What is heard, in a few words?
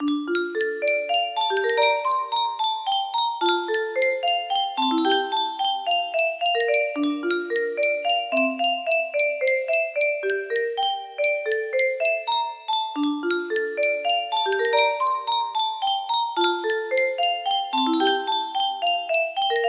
music box harp and hammered dulcimer